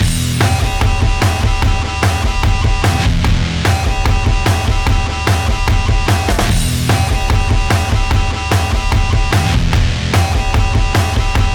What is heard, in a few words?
BGM ramen